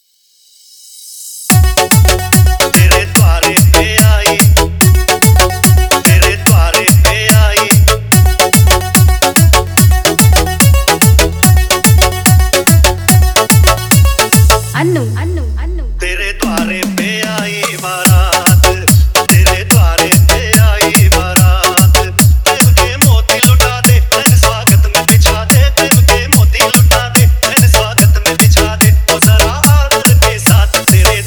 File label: Barat Special Remix